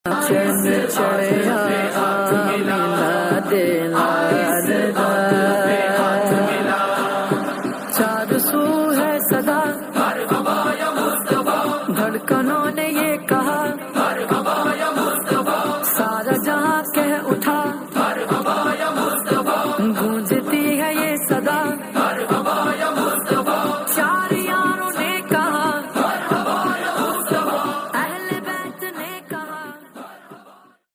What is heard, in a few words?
islamic ringtone